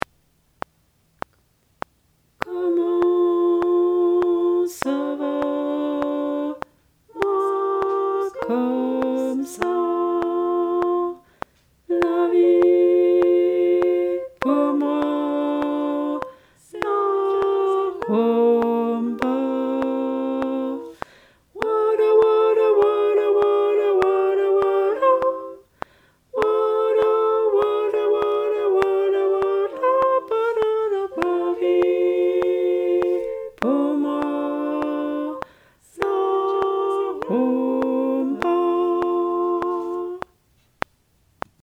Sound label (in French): Basses